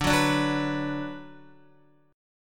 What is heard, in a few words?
D 6th D down Guitar, chord